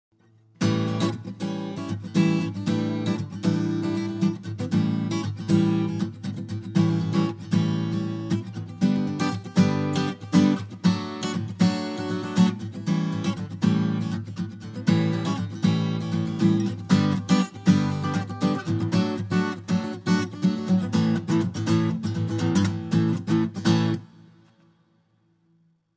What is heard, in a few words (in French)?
guitare